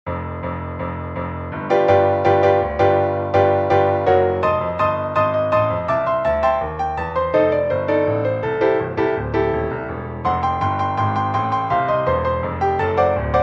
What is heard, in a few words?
audition piano accompaniment